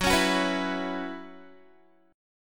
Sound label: Gb 7th Suspended 4th Gb down Guitar, chord